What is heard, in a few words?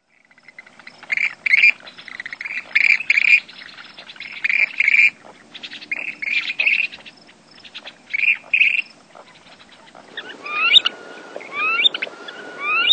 Western Grebe; Birds